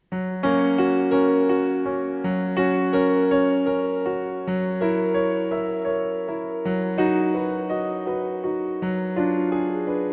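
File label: Duo, Piano Vocal Live Lounge Style Duo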